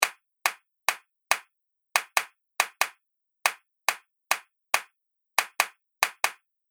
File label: Hand Clap Groove Loop; Percussive And Rhythmic; Seamless loop